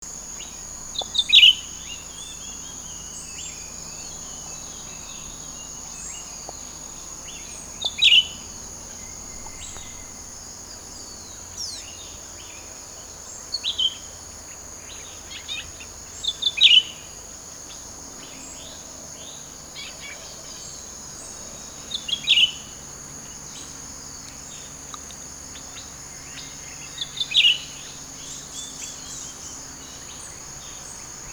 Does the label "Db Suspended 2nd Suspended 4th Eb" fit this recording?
No